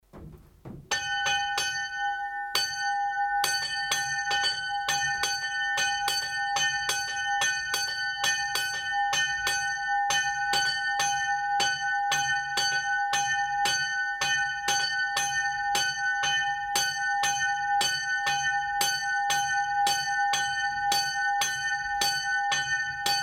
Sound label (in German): Zumberk kleine Glocke